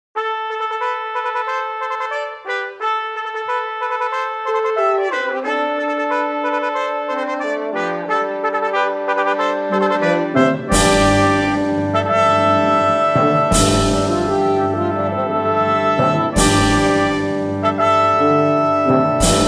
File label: Fiesta Brass